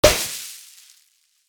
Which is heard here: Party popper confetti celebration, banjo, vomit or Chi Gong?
Party popper confetti celebration